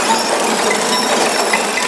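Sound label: A#4 squeal; WAX SPROCKETS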